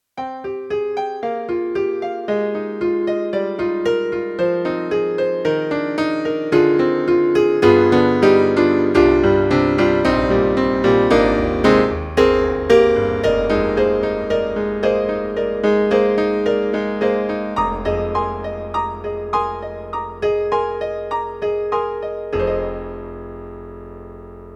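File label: YAMAHA MDP-5 XG Grand Piano K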